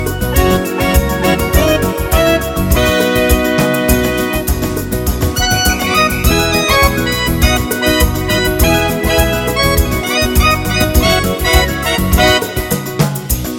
Another Hot Samba

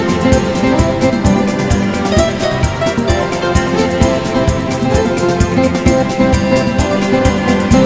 A Fast Bossa Nova

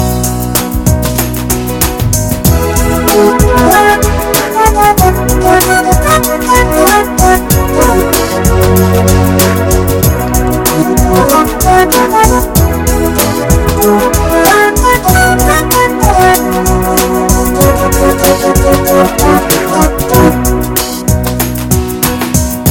Hot Soft Eight Beat

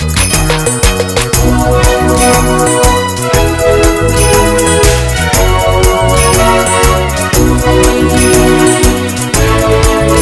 Super No Name Pop

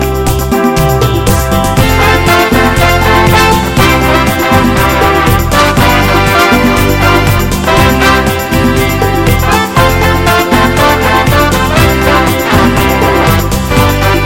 Sizzling Tango

